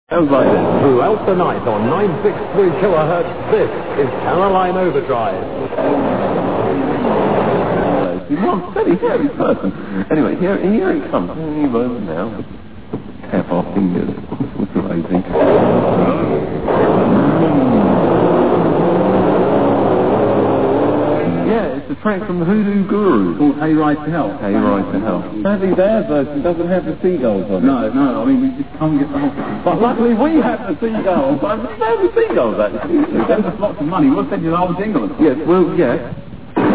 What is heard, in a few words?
jingle making overdrive